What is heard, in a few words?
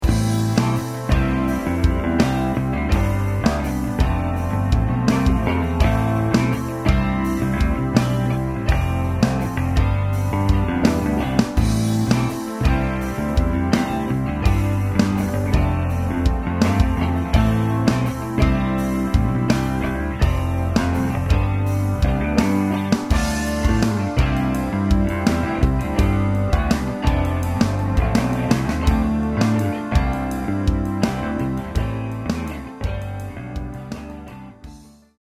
Rock Mix Headphones